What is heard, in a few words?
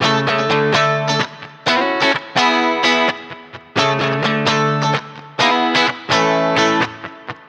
Guitar